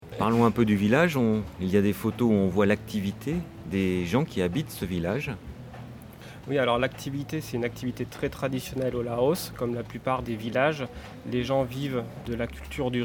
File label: EDM